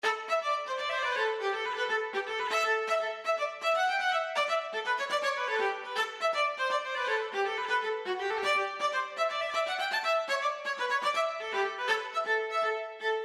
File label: bg2 viola; Highland Reels